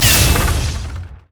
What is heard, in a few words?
death ray; Viktor